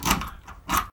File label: door lock; deathmatch